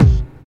kick drum sample A key, kick one shot tuned to the A note, Loudest frequency: 218Hz; kick drum samples